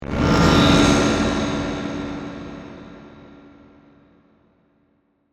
Gong, Distortion, Reverb; Glitch; Glitches; BlastWave

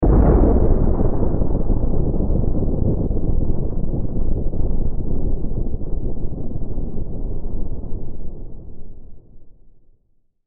Science Fiction Planet Explosion Deep Space; Sci-Fi

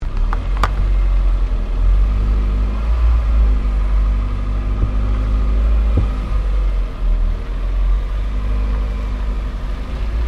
Car Toyota Starlet reverse; Toyota hire car in Japan; Cars (Sound Effects:Vehicles)

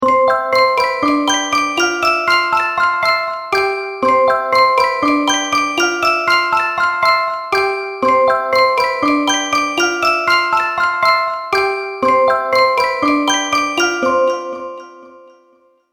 Music Box Musical Interlude; toy melody

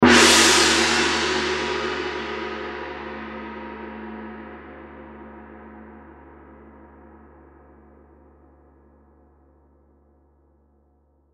Gong Cymbal Crash; Cymbals; Musical; Blastwave

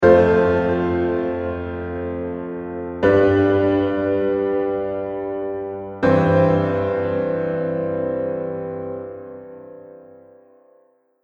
Epic Opening Scene Piano; Musical Sound Effects

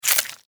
Horror Squish Suction Wet Juicy; Monster; Monsters

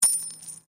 Coin Penny Multiple Drop Stone; Common coins; Money; Objects

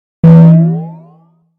Hit Ring Boing Rear To Front; Blastwave

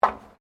FOOTSTEPS WOMENS LOW HEELS ESCALATOR STEP; Footstep Foley; Footsteps (Sound Effects:Human)

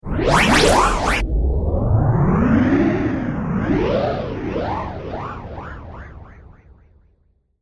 Magic Vortex Spell; Magic Rpg; Magic Sound Effects

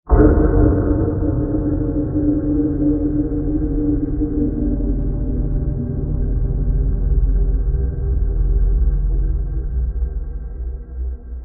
Low Impact Blast; Low Impacts (Sound Effects:Impacts); Crafted Signals

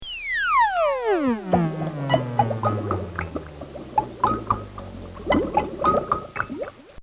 Cartoon Sound Effects Whirls; Cartoon Sound Design; Bobby Cole

